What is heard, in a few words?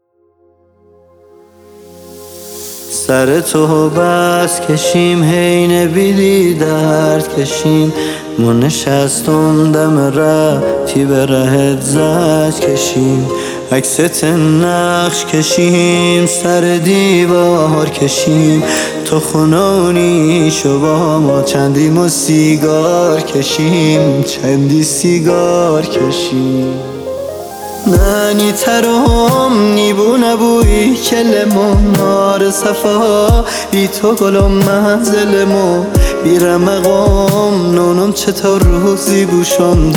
piano